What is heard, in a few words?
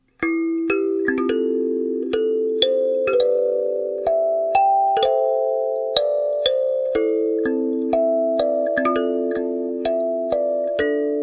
kalimba cover; inuyasha